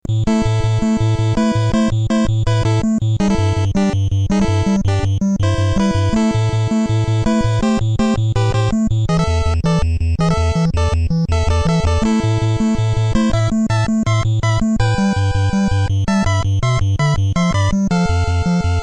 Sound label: theme; punch out